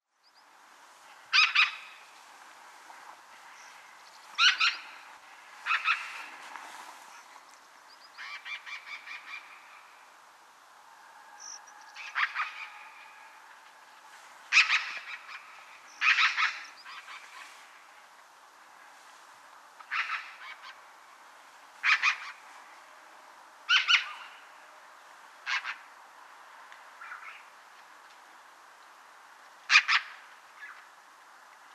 Black rumped Magpie Pica bottanensis, black rumped magpie call